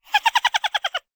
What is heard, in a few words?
Fairy laugh